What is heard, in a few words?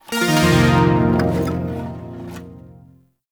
Shawzin Un Equip; Music; Warframe; Zariman